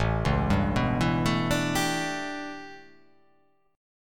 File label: A 11th down, guitar chordshape; arpeggiated